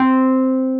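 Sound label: WURL EP C4, Wurlitzer electric piano; Vintage; Sonic Reality; Vintage Timetraveler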